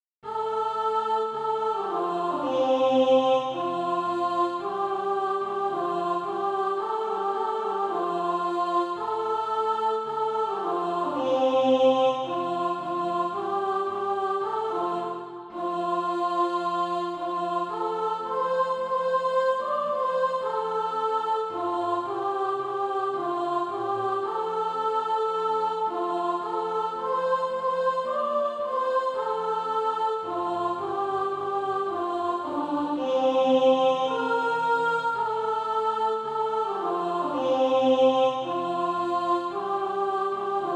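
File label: soprano, SATB Practise Music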